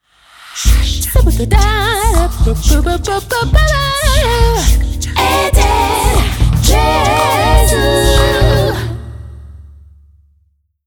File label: scat